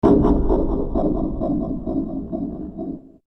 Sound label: impact